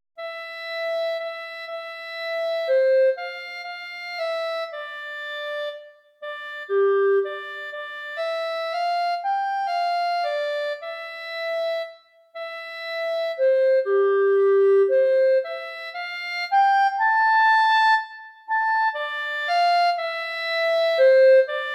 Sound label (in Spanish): para clarinete violonchelo; danza cortesana